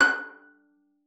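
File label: violin pizzicato F#5; Xsample VOL-5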